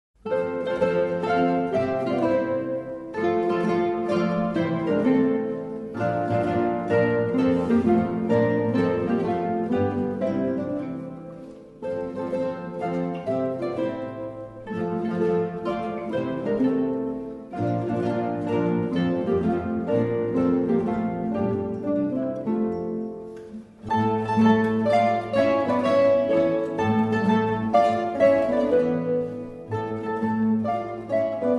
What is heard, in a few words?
Mazurka